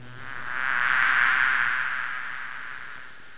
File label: Insect Swarm; Death Blade